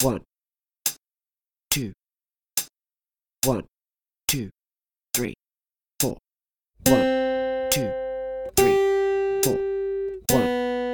2 Strings Longs, guitar

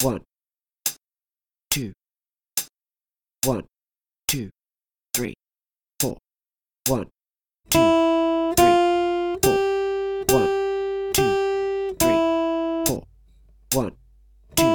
E string, guitar

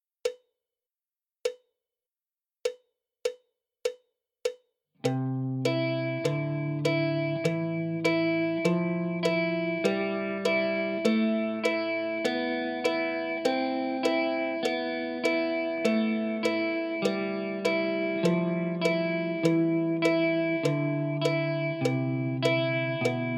C Maj Skipping Exercise, guitar

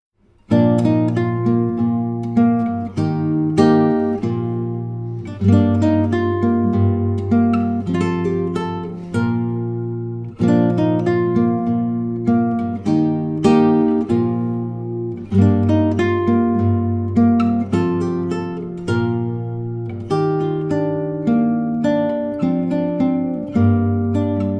Fingerstyle Guitar; Fingerstyle Guitar V2

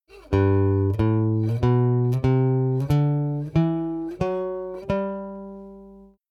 G Major Scale 1 String

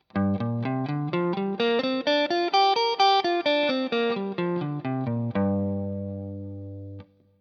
G minor pent Shape, guitar

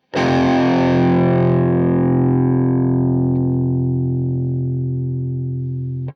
f Power Chord 3 Notes, guitar

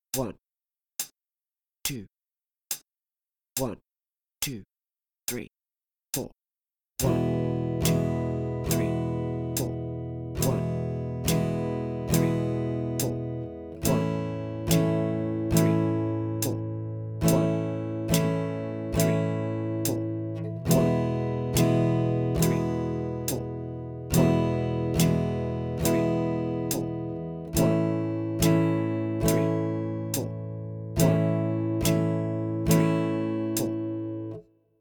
2 chord ex 3, guitar

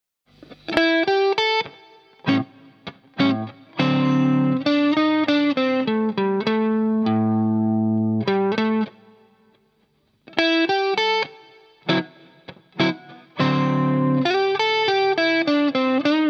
12 Bar Blues Study, guitar

